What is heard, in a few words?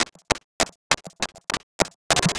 perc 100, Percussive Loops, 100 bpm